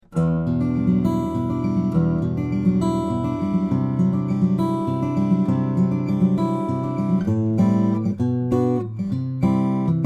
concerto, steel string guitar; fischer steve; flattop

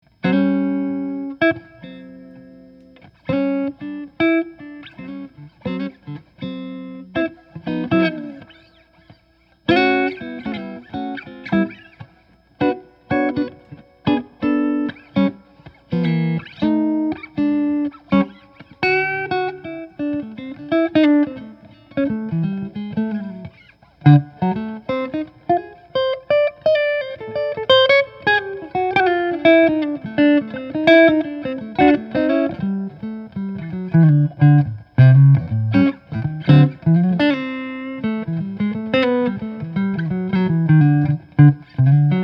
guitars; Buscarino Artisan Custom archtop; maple; sitka spruce

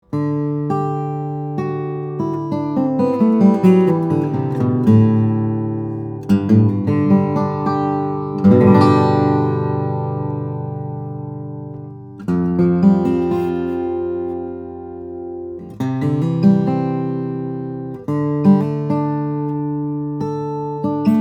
Zimnicki 00, steel string guitars, flattop; the warbler; black walnut red cedar

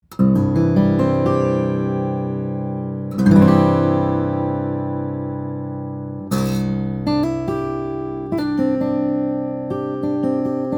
guitars; Tippin Crescendo; madagascar rosewood; sitka spruce; flattop

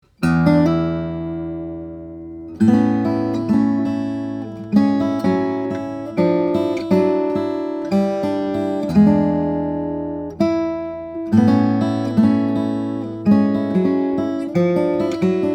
Grand Concert, steel string guitars flattop; bashkin; brazilian rosewood; lucky strike redwood

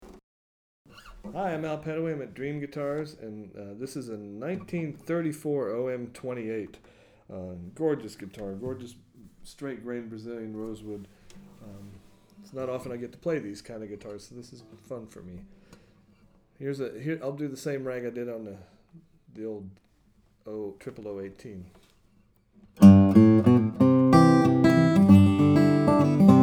Martin OM-28, steel-string guitars, flattop; vintage prewar martin

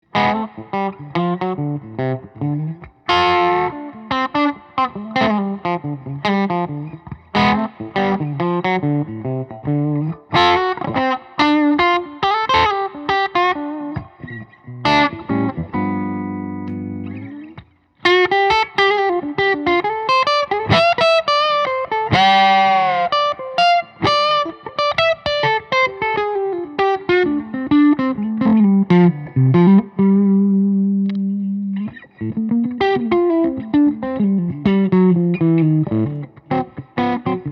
semi hollow electric guitar; beardsell

